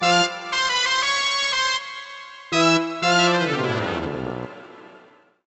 Failed; Go Go Island Rescue; DSiWare; NDS

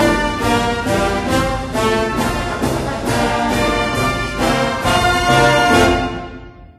Touchdown Song; NCAA Football GameBreaker; PS1

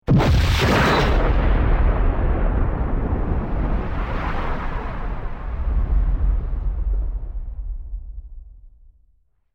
death explosion; Star Trek Adversaries; macos

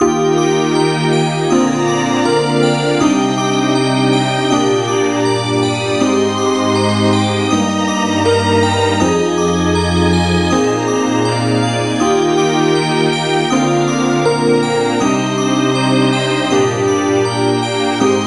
BGM Winter; puzzling masterpiece exploration 2009 nds gamerip

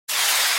Bullet Launch

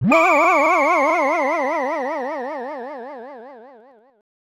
Falling; marios mystery meat; snes

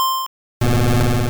Barrage; Pokemon; Gen 2; attack moves; GSC

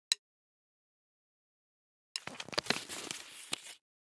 Chopsticks Card; Yesterday Origins

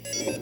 pinball sensor

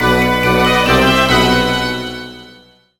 Jingle Weapon Up; Monster House; ds

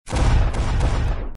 explo Boss; deep underground

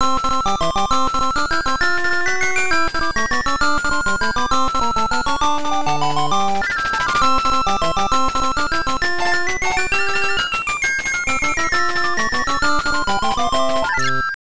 Tiny Fanfare; mizubaku daibouken; turbografx-16

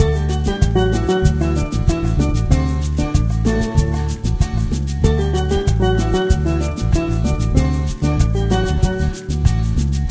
Cruise Music; Diner Dash Flo on the Go; NDS

